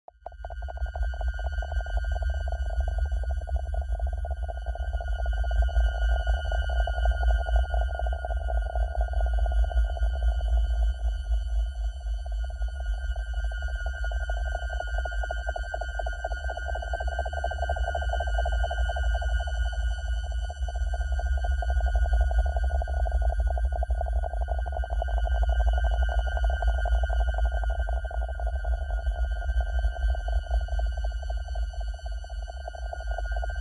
Alien Cosmic Transmission. Clicking With High Morphing Tone Modulating Alien Communicator Static.; beeps